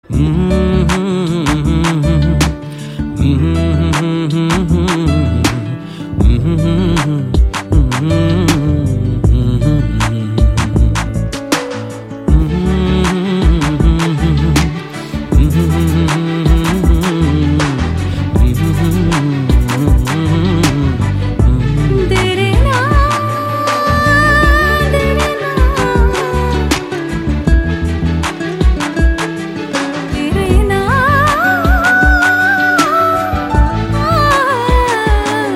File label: Humming Theme Bgm